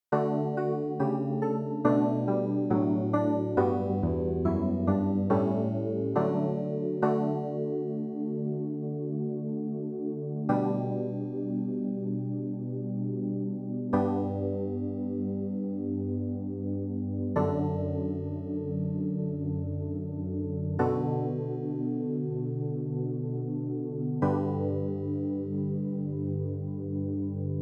CLAVIER; Psaume88

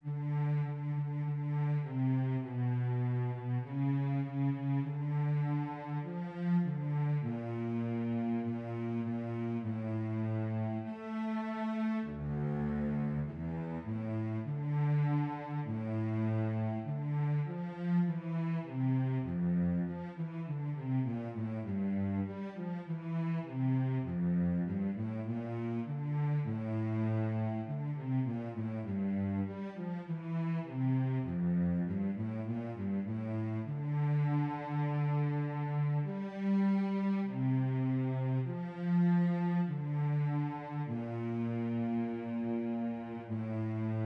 VIOLONCELLE

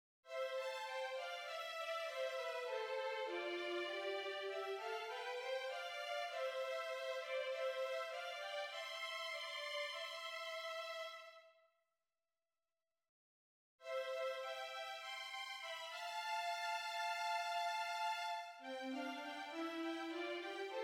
VIOLONS

